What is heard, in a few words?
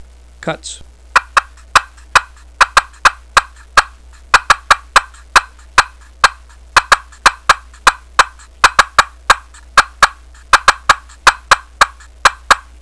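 cutts, turkey call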